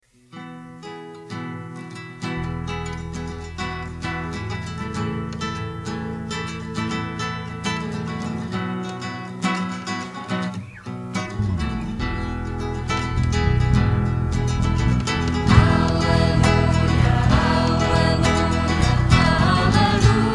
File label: Aleluja